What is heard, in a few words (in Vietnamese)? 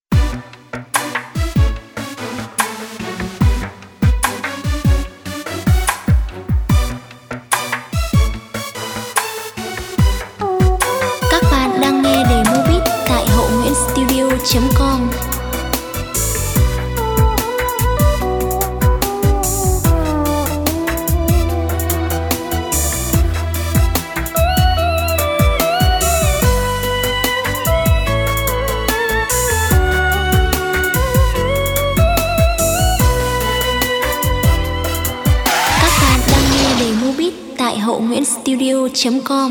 lo yeu beat